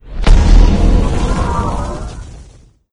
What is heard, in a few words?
resurrection; doom3; archvile